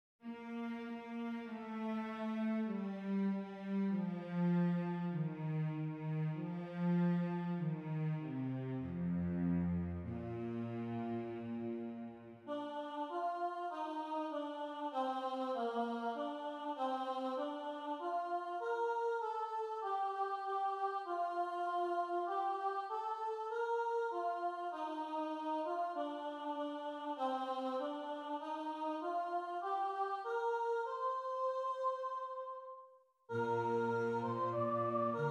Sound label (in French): violoncelle; PriereDeFrancois